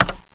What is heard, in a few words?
Button; KARUTA